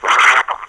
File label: gurp; openffa; mercy